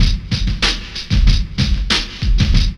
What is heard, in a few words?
LOOP; E-Lab; X Static Goldmine 2